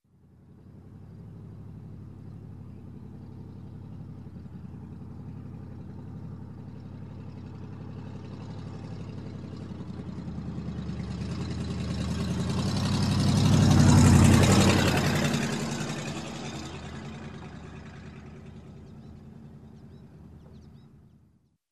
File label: Old Rusty Truck Passing by